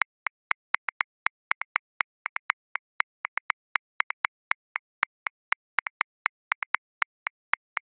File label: Straight Five Rock 5/4, Drum Booth, Grooves; Ableton